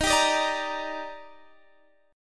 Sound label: D sharp Major 7th, Guitar DADGAD